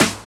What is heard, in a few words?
SNR; AMG; Now CD-ROM (Roland)